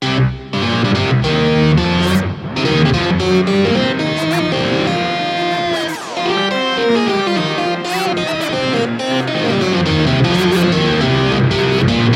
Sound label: FM Lead Overdrive Pitch Shifter Chorus Delay Reverb